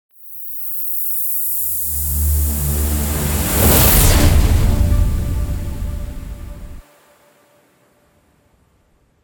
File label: Transition End; everspace 2